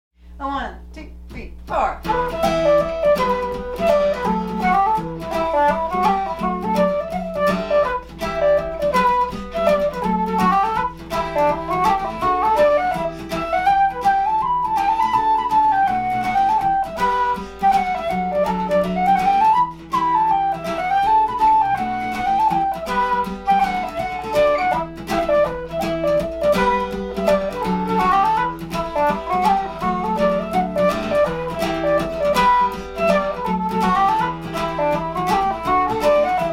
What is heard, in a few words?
Flute and guitar